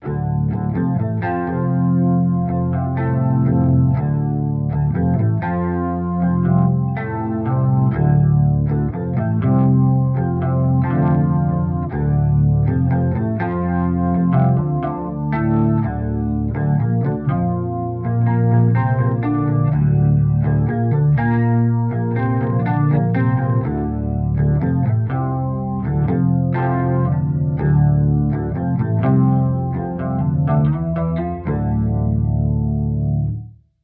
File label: Boss GT-1000 Core Octaver; Vergleichstest